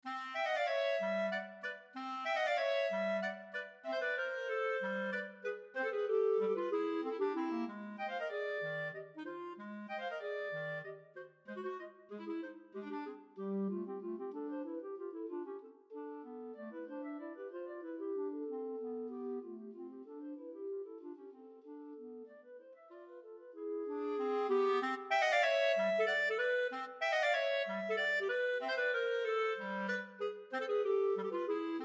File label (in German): divertimento 2 klarinetten